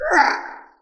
Flash Character Die

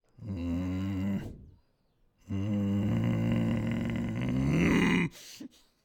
Man Grumbling

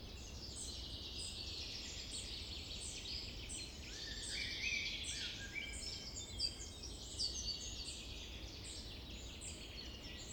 Forest Summer

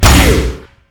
Laser Gun Shot

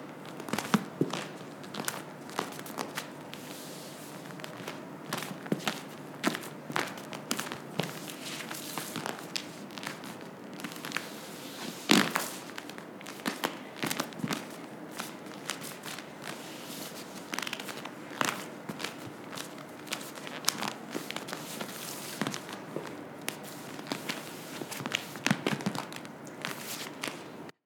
Dancing In Ballet Shoes Spins Squeaky

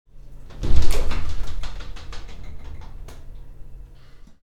Shower Door Open

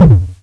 Pulsed distorted bd

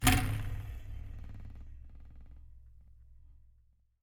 Colide

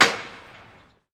Big Hammer One Shot

